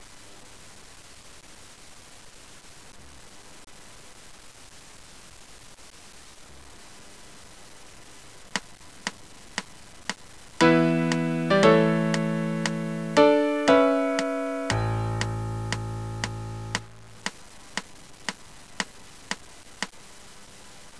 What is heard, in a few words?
theme with click, piano; Halo 3